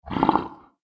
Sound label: horror monster grunt; Monster / Creature Grunt; Monsters; McKinney Sound